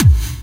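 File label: hard bass drums; Bazzdrumz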